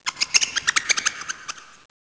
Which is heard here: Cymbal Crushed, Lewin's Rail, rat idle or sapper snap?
rat idle